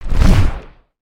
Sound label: Wing Flap; creatures organic